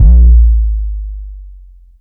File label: G# Bas; Bas Let Down; Loopmasters; Jon Carter & Alex Blanco; Block Party Essentials